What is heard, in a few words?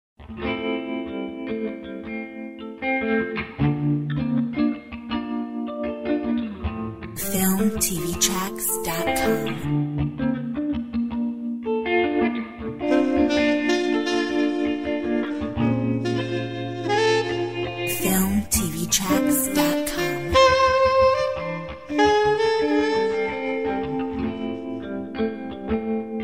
Saxy Gtr; Filmtv-tracks